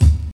short steel kick drum sound D# key, Royality free bass drum single shot